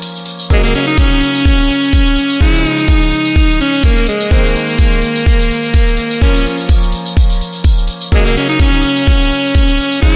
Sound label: Saxo ringtone